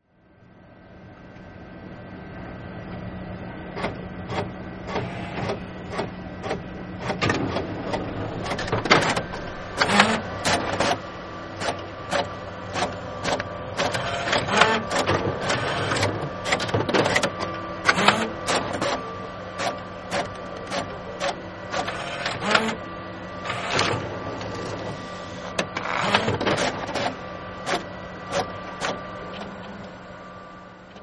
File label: Printer Printing, Printer Printing Paper; Printers; Electronics